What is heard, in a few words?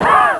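sick cough; Easy Personal Web Page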